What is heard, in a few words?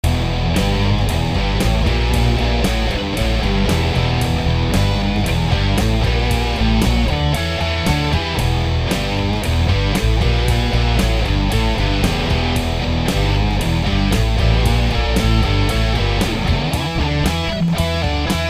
funky groove